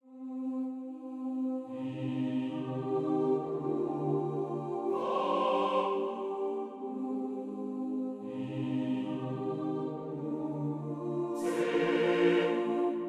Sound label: Garden Track(CHOIR)